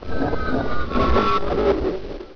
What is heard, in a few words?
lever